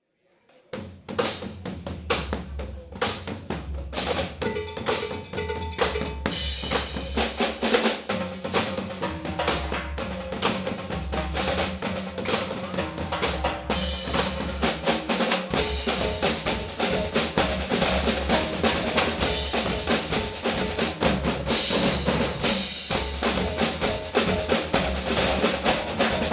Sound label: Green beats Baker groove